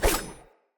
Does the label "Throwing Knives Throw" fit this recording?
Yes